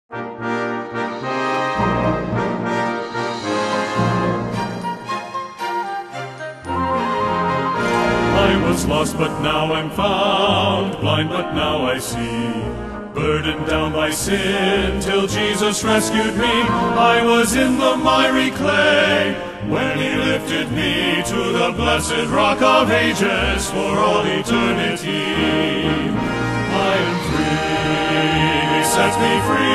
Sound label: men's chorus